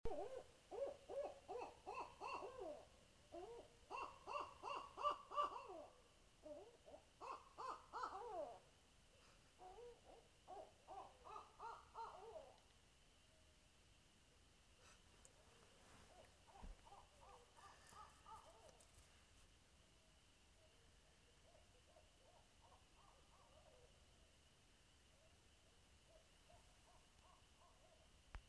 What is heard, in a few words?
owl